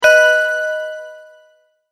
multimedia tone correct

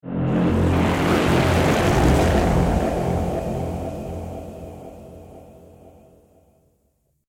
Whoosh Transition Static Dramatic Distortion; Ominous Foreboding Evil Scifi Science Fiction; Sound Design; Whooshes

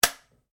RIFLE ANSCHUTZ 67MSR 22 CALIBRE DRY FIRE; A selection of domesttic firearms; Rifles; Guns

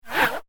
Platform Game Laser Sound; electronic

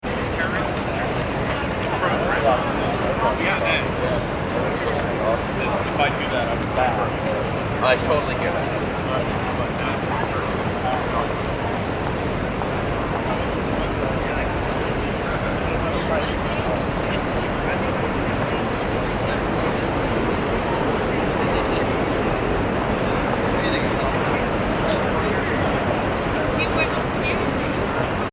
ambience urban city street; New York City Street Ambience ( Broad Street ) Medium Busy - People Walking And Talking Wi; Backgrounds; Nyc Street Ambience (Broad Street); McKinneySound

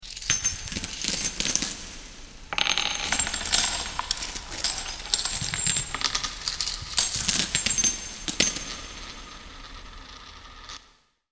Pirate Treasure Chest, money sound effect; fantasy sound effect

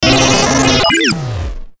Stinger Beep Sweep; Production Element Imaging Element Accent Transition; Blastwave; Stinger Sound Effects

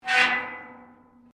METAL RUSTY SCRAPE

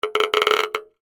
Spring Stress, Thunder, Tube; Texture; Twangs (Sound Effects:Objects); BlastWave

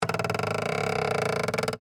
Creak Plastic, Texture, Creak, Plastic

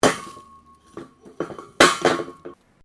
METAL PIPE FALL; Materials

